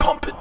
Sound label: pumpit, audioloops